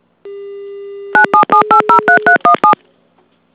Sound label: DTMF